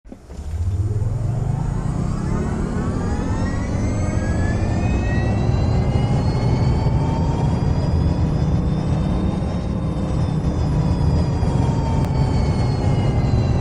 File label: Myli electric engine sound; ligier myli